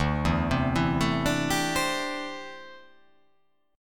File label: D 7th Suspended 2nd Suspended 4th D